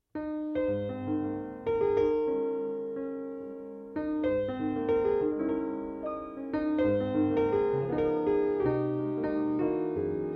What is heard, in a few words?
piano sketches